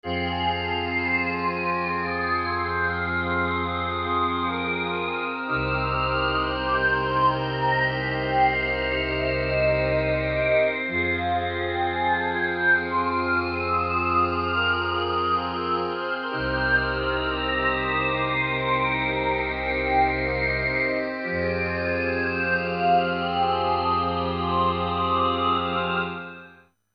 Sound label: strings; barniesdream; southpole